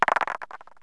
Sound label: golf